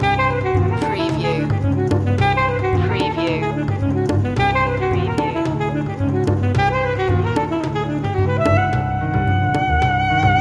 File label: rhythm dance loop